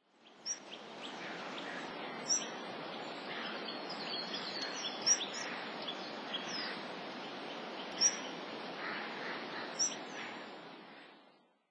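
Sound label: Grey-streaked Flycatcher; nasuhio; koe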